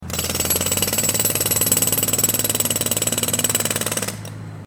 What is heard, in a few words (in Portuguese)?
som de britadeira efeito sonoro